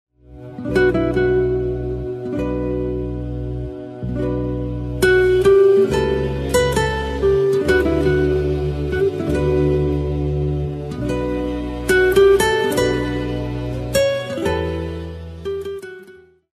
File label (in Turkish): SANTRAL MÜZİK